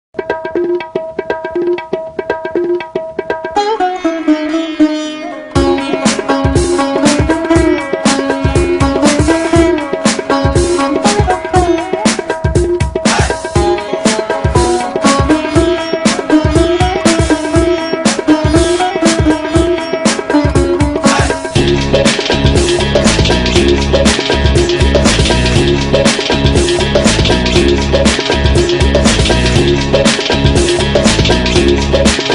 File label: surfing sitar